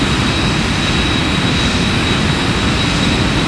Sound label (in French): reacteur, ambience; dod